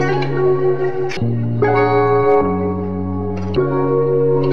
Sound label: guitar chopped, Loops, Phonky